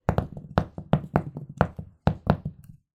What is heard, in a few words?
foley conkers many drop on carpet floor; zapsplat